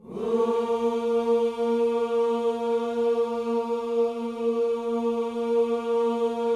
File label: WHOO A#3, Vocal Planet, Gospel; ILIO